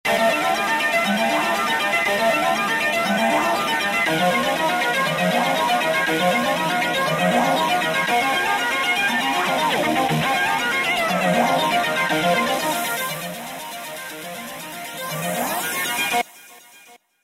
FM Loop, Roland V-Synth; Factory Patches

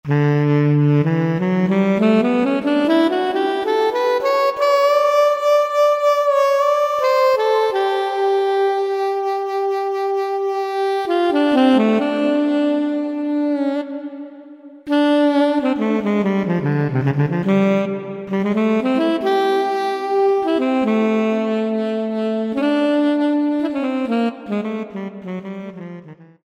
Alto Sax, Roland V-Synth; Factory Patches

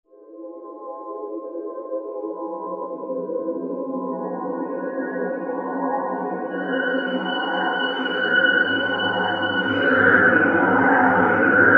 Icy Pad, Roland V-Synth; Factory Patches

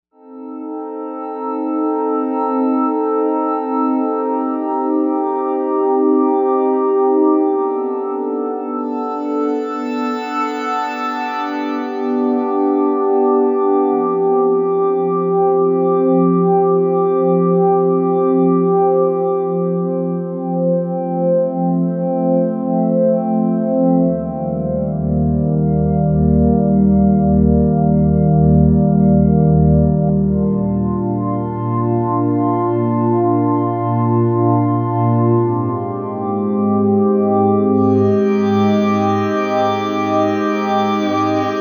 Simple Pad, Novation UltraNova; Factory Patches

